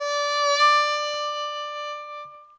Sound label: verdigris7 harp; backwards